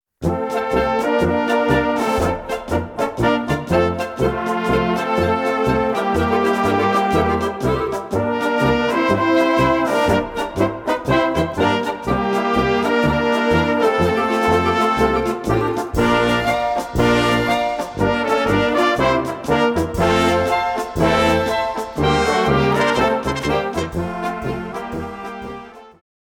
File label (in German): Blasmusik, Servus Polka